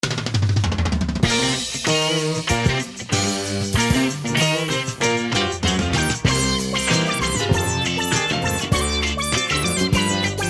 christmas kit instrumental beds we wish you a merry christmas